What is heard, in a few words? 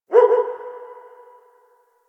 Distant Ambient Dog Bark